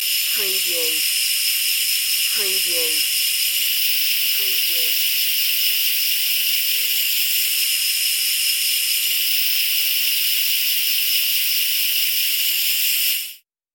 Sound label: Leaking Gas